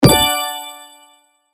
ブロックが消える

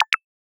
メッセージ

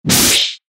剣 通常攻撃; 武器効果音

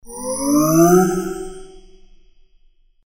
呪文を唱える モノラル; 魔法効果音

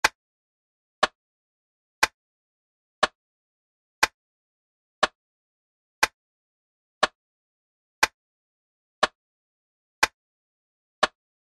秒針の音

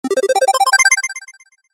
決定 中音 レトロ モノラル

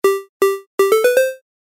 ファンファーレ レトロ

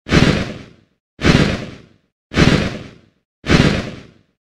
モンスターの羽ばたき 4回 遅め

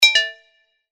キコン 木琴 音高め

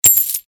コインを入手 モノラル

